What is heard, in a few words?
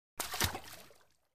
Swimming; Single Splash From Arm Movement.